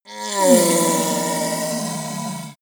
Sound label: ogre grunt painful short; kreaturen